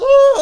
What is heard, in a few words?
Attack Vocals